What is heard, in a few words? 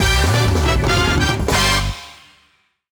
Mini Game Level Clear; frogger ancient shadow